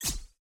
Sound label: map help